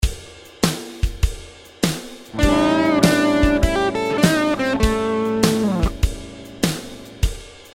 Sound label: blues note bend; 3 essential notes; better blues solos